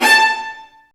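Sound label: Marcato, Strings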